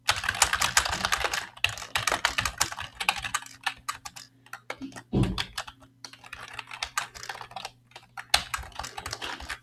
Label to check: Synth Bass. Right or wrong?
Wrong